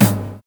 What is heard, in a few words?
LOW TOM; Univers Sons - Kitch 70s